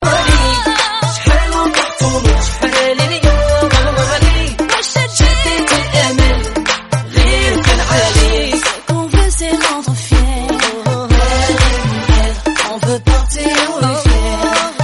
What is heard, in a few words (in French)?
arabe francais melanger sonnerie